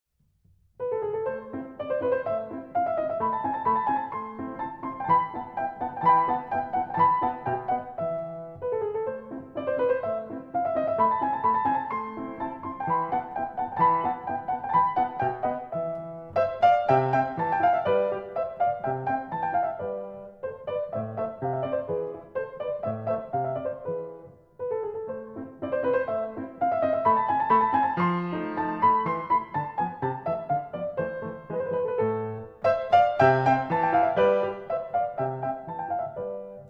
Piano Sonata in A Major, Alla Turca Turkish March; Piano Sonata No. 11; K. 331300i

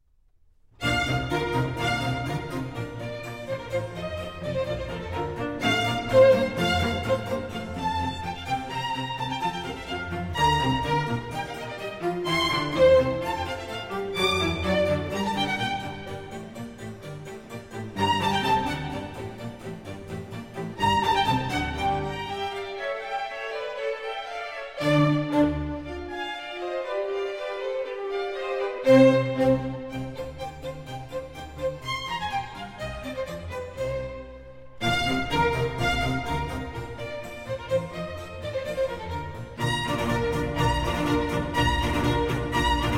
Concerto for Strings and Continuo in B flat

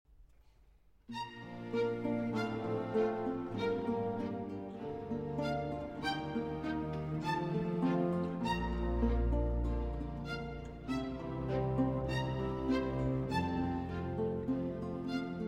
Concerto for Strings and Continuo in B flat

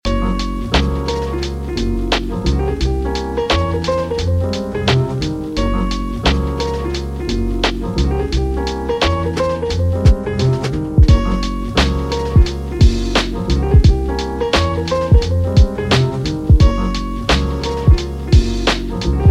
 (Instrumental Bonus Track)

